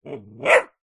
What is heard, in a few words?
animals dog puppy several weeks old single bark ridgeback cross bullmastiff